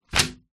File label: foley paper sheet a4 slam down by hand on glass table